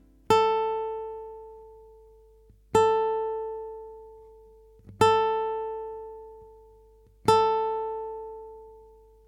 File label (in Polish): chwytanie poprzeczki 1 struna